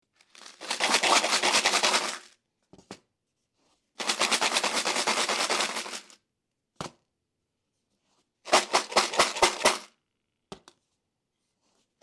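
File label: shaking piggy bank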